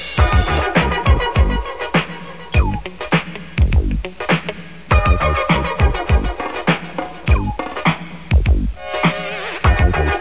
jungle

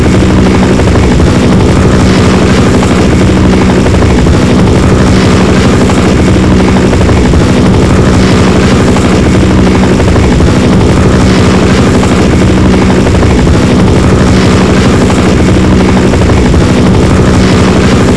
helicopter

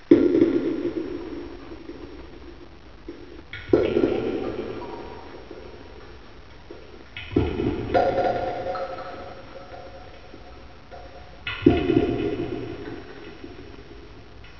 water drips

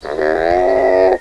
brawwrr

